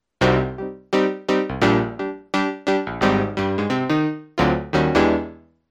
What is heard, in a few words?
Electric Grand